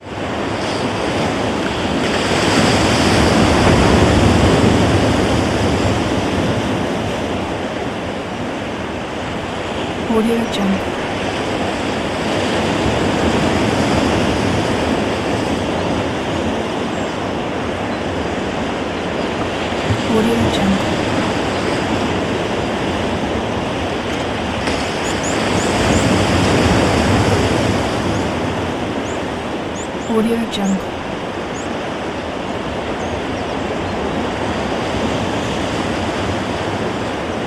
ocean waves gently rolling onto beach

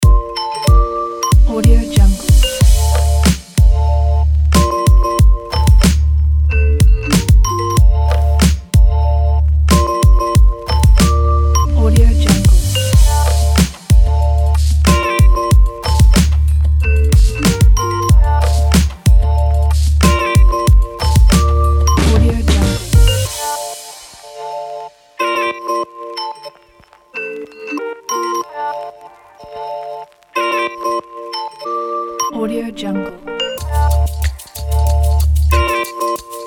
chill fashion hiphop

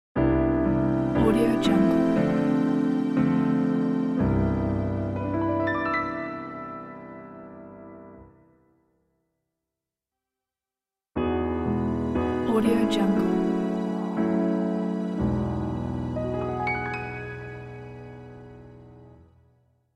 simple piano logo; crazy-audio

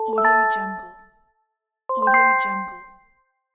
complete notification; fx-market

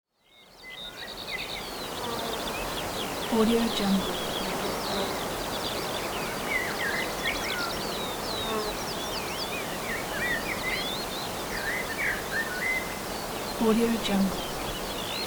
windy field ambience

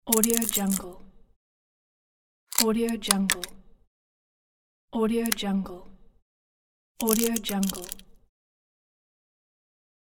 cracking glass

